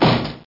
BUMP BANG

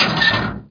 lever; Heretic II

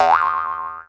CD KICK; CyberMycha Extra 1-2004 (Poland); Effect

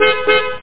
hoot, trains; Assassins 4

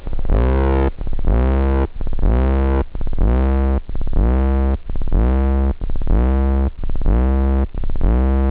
alarm; OctaMED SoundStudio V1

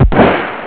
boom; Critical Mass

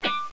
noiz; Music Toys; star

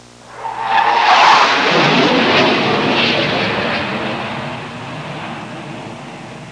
jet fly, transportation; Web Clip Empire 150,000

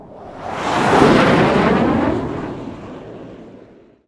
jet; wob2 demo

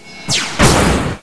laser hit; XMEN2

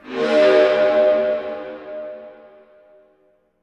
low bowed mandolin; DirectX SDK; DMusProd

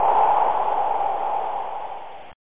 Wind end; Weather

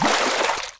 water movement; Spearhead Demo; MacFormat 2003 June

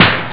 snare; OctaMED SoundStudio V1

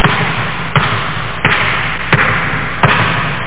ball bounce; Epic Collection 3, The; Epic Marketing; muzak

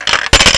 Coin Slot; Vegas Poker Kit; Strategy

